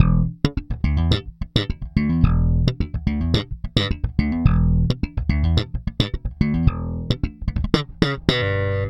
Slap, Bass; Bass X

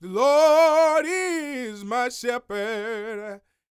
Vocal Gospel; Vocal Planet Vol1 Gospel

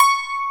YANG-CH'IN; AKAI S6000 CD-ROM - Volume 1

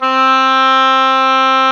OBOE, Orchestral Winds, Oboe mf f

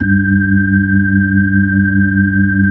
B-3, Brite; Keyboards of The 60's and 70's

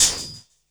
PERC, 170 BPM; Ueberschall; Drum & Bass Resonance

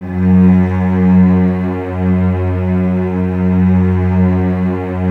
cellos F#2, String Ensembles; Miroslav Vitous; 90 s Sample CDs

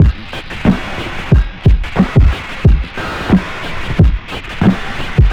interference, Freak Beats, 90bpm

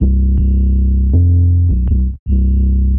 Pure Bass; Sonic Foundry (Sony Creative Software); Knutrix Drum & Bass

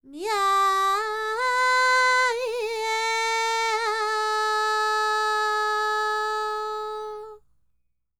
World Voices, INDIA; ILIO; Vocal Planet

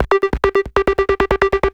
percussion loops, Techno; PS-45

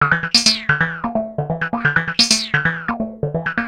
Analoop, Industrial Analog

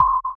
SONAR L; Spectrasonics; Metamorphosis

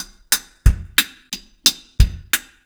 RG90 DRUM, REGGAE

